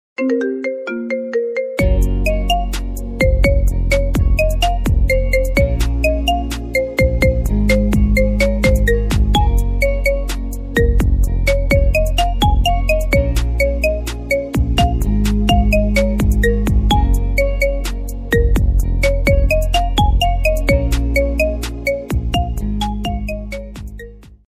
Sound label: Marimba Remix Marimba Ringtone